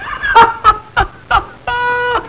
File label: Laugh